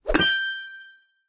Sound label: Consolation prize Pling; Candy Crush Saga